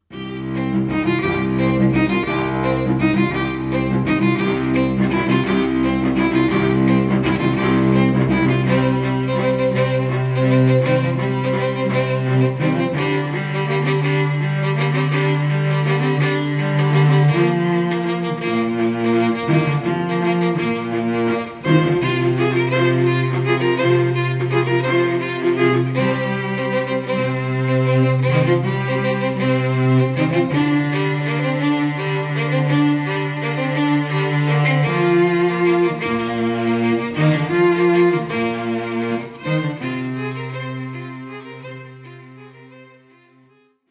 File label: String Trio